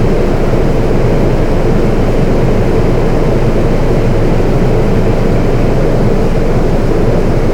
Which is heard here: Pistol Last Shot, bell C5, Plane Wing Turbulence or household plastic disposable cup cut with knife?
Plane Wing Turbulence